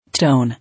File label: Tone